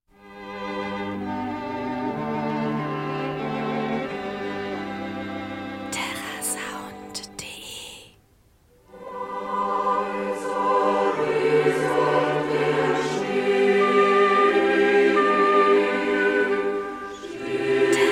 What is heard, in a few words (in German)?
leise rieselt der schnee chor; terrasound